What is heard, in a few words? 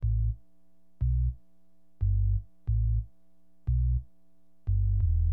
LOBASSD, Hip Hop, Bass; LiveAct Pool 1